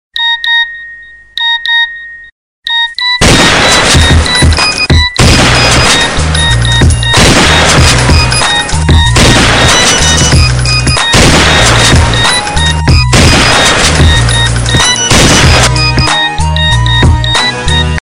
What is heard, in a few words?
Nokia Remix